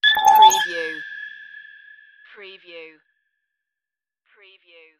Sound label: space message alert